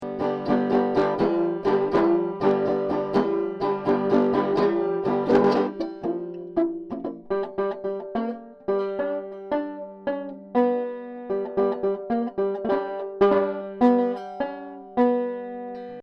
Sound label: cookie tin banjo contact; mics i made